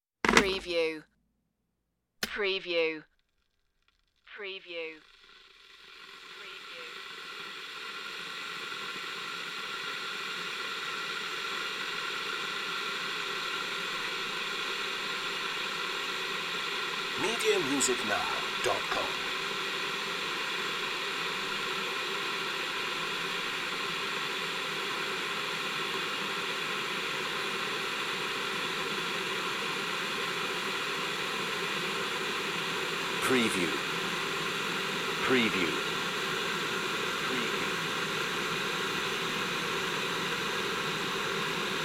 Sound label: water boiler